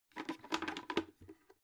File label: bucket handle use